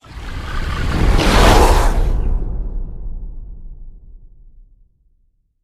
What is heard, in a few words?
Bigfoot Guardian Growl